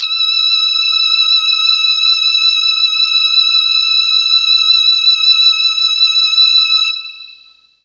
E4 3 violins, Mellotron MKII violins; Mike Pinder - Mellotron Archive CD

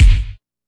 KORG 01W KIT; Rubber Chicken Software Sample Bank

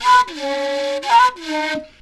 FLU + VOC, FLUTE + VOCAL

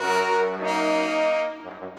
Brass

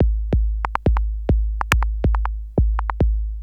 Beats, Industrial

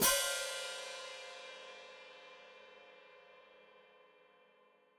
Hat Closed Open, Hi-Hat

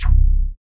WET BASS; Masterbits; Dance Trax

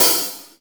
HH CL, Drum 1 Dry; East Collexion

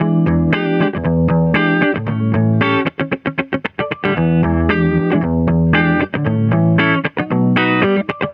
GTR; USB Soundscan vol.32; AKAI; Roots Disco

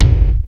Kick, Fairlight CMI III

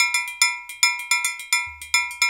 BRKDR, Reggae; Spectrasonics - Supreme Beats - World Dance

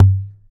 Dholak; Roland BK-5; Percussion

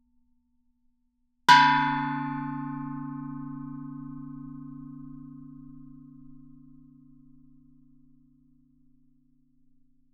Gamelan; Propeller Island - Complete Gamelan

